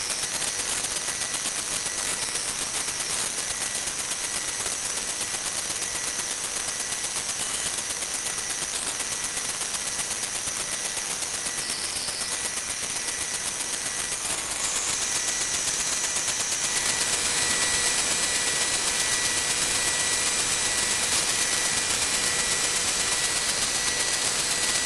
electric electronic data hard disk pulse crackle spurious long; sonniss gdc